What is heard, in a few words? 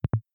Button Click Disabled